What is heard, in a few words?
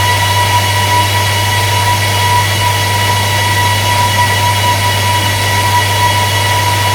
Abrams Engine Max; DCS